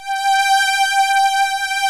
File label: ANALOG STRING; OMI - Universe of Sounds